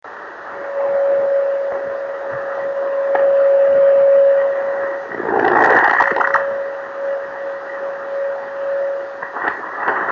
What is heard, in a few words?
26535KHz, driftnet beacons